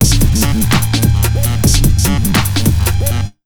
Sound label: AMBIENT 147, Big Beat Drumloops